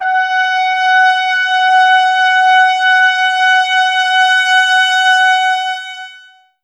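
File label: brass trumpets F; 90 s Sample CDs; Miroslav Vitous; Woodwind Brass Ensemble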